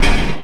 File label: Snare, Kit; Sonic Foundry (Sony Creative Software); Esoterik Beatz; Agrymet